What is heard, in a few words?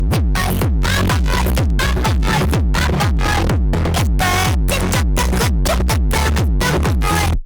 bass boosted